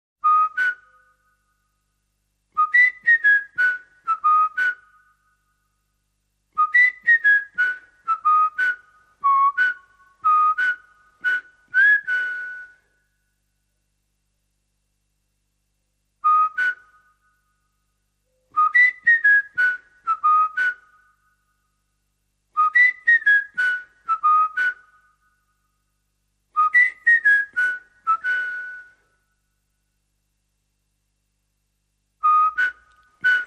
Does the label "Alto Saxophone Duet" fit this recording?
No